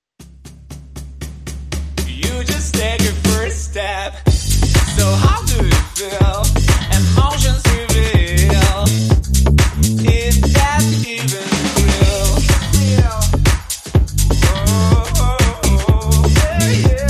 Drums Bass and Vocals Only

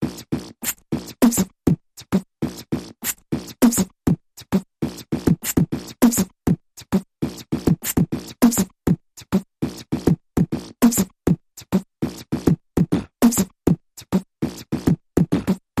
Big Mouth Percussion Variation

